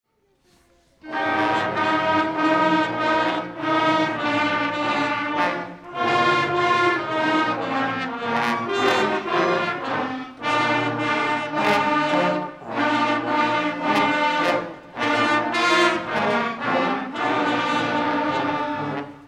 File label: Jingle Bells